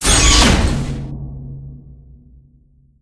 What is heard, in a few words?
door close; jailbreak; Portal 2; Source Engine; video games